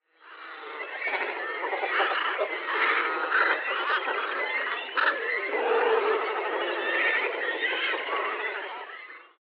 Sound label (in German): Kormoran Brutkolonie; vogelportraet; phalacrocorax carbo